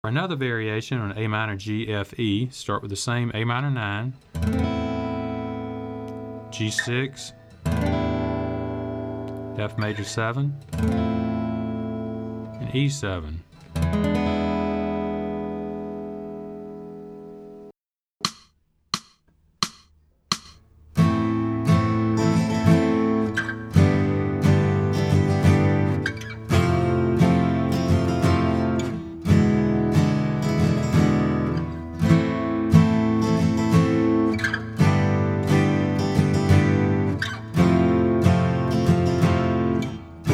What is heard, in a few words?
Am G F E Progression